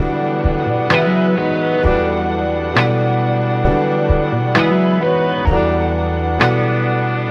horns breathe slow, Loops, Phonky

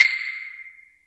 Misc Percussion, Clavas

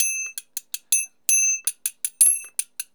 bellsy, Drum loops

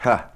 "ha", Voice

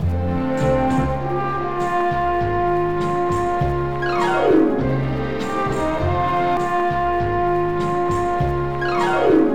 horns, phonky loops

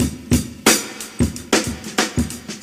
wah wah, Funky Drum loops

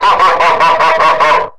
laugh demon

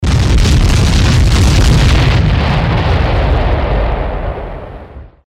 explosion; Crash Bang Boom

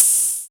Cymbals Electro

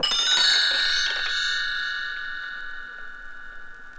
chimes

